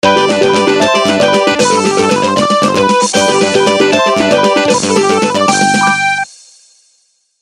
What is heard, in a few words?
Classic (Game Clear); bomberman reloaded mobile gamerip 2007